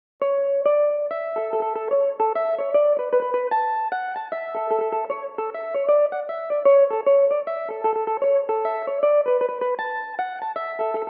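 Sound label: A major, Irish mandolin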